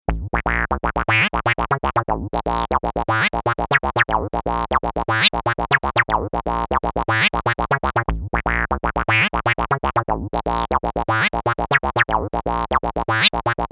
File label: AUTO-WAH